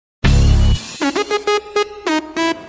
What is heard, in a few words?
Sms Tones